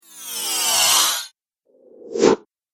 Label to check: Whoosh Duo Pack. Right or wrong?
Right